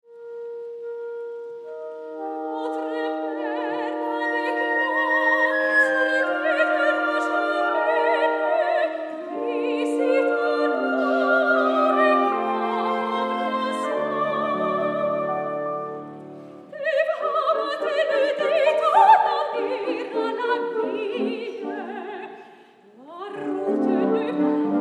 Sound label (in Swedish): Duett